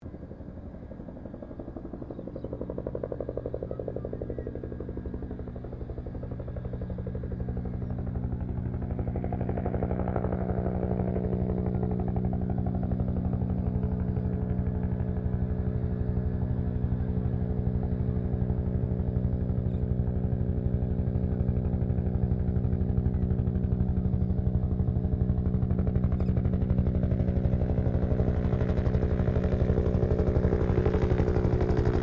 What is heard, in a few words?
HELICOPTER BELL UH1 IROQUIOS PASS LONG; RAN UH-1 Iroguios; Helicopters (Sound Effects:Vehicles)